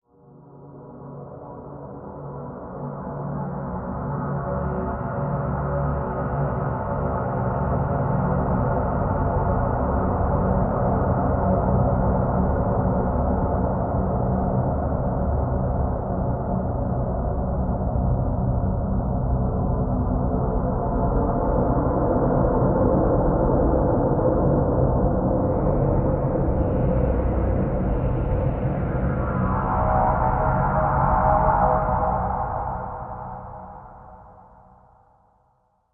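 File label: Drn Dimensional Simple B, drone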